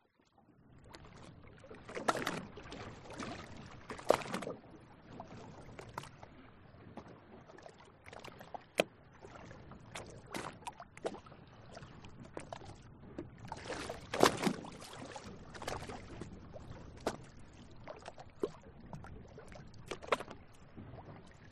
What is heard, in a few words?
transport boat small engine off floating sea small waves lap on side onboard